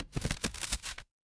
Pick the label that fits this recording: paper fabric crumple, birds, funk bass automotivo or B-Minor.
paper fabric crumple